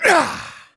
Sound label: vce punch